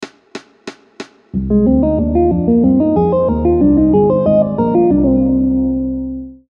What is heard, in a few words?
triad